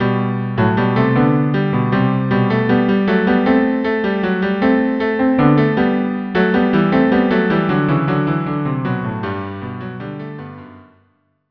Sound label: cello duo